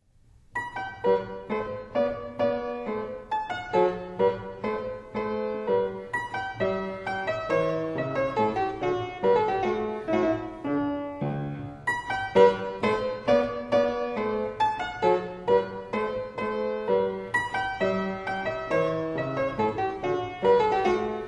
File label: anton walter fortepiano